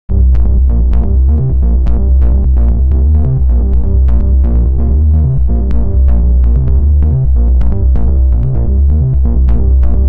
deep and groovy bassline, analog

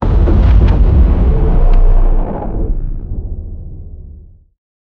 planetare explosion, detonation

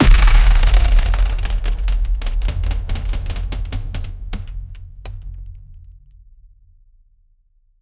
frenzied drums overlappin, pulse

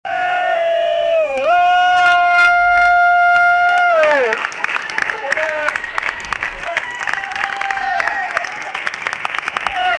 celebration after cricket

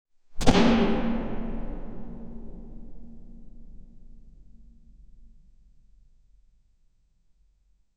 a cinematic thunderclap w; environmental

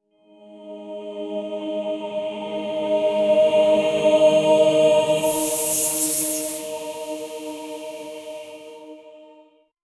spiritual mystical music

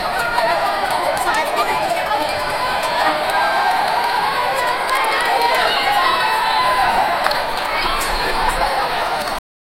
chatter turns into crowd, school

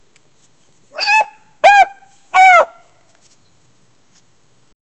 audio of a dog yelping; pet

